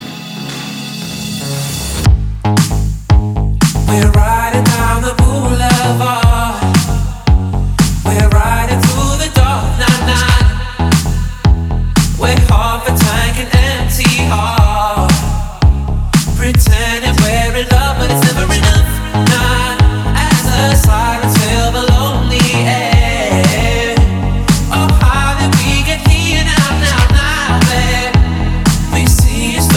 elektric fast drive

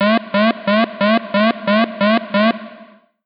street public alarm

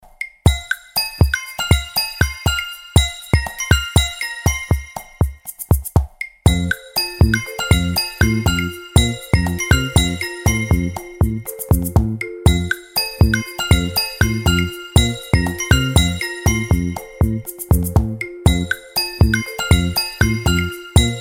treble bells

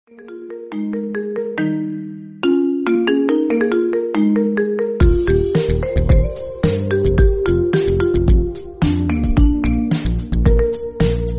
iphone 12

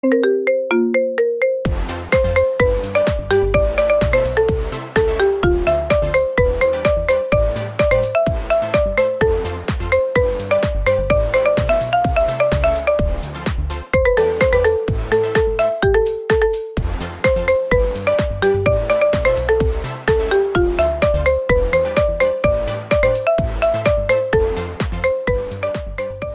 marimba remix

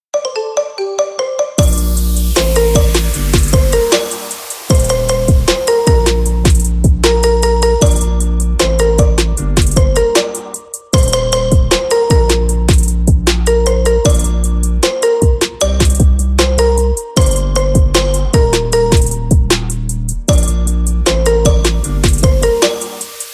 marimba

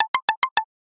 vivo x3s notification

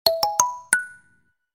silvery notification

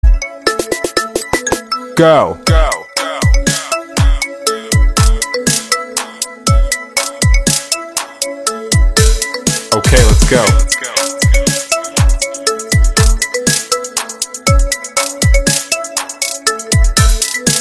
samsung galaxy s10 ring mix

